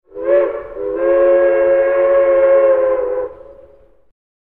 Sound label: Steam Train Whistle